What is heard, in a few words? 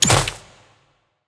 space tile drop